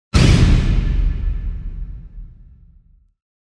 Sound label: Shred Hit; Gothic Storm Music